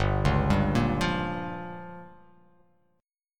G Minor 7th Flat 5th

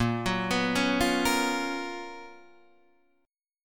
Gb Major 11th

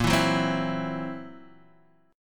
Fsharp 7th Flat 5th, guitar chord

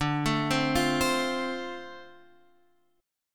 D-Minor 7th D, chord; Dm7 arpeggiated; x,5,7,5,6,8

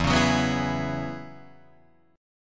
E 6th Add 9th E down Guitar Drop D

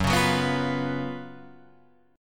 Gb Major 7th Suspended 4th Gb Guitar Open F

